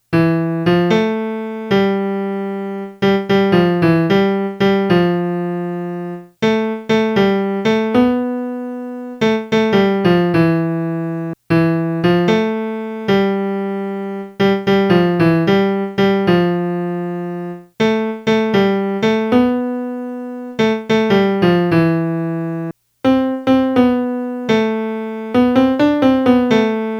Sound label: melody